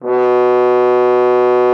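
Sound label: French horn H3; Xsample VOL-3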